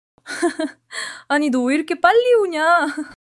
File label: laughter